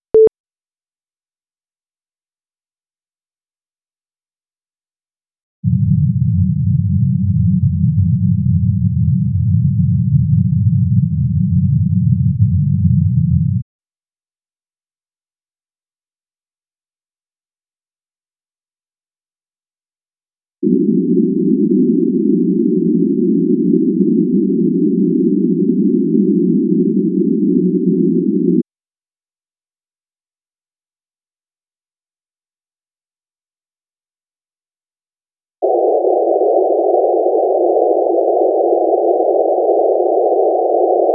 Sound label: test seq 125 4k